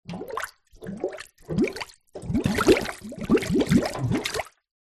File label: nature water bubbles blown into basin very light room reverb